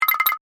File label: Percussive Element, Cartoon; Cartoon Sound Design